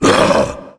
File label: growl; Left 4 Dead; Source Engine; video games; tank